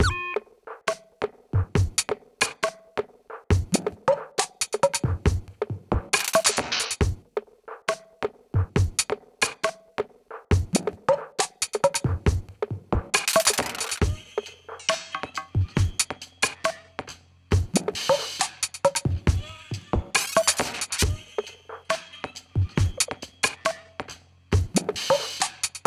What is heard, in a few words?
Caves Drumz